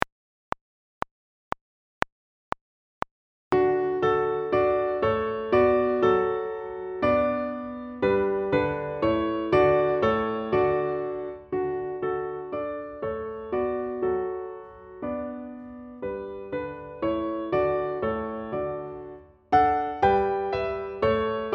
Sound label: Accompaniment; Air by Handel; ViolinSchool